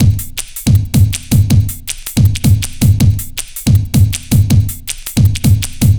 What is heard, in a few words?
160, Drum Loops; Total Drum&Bass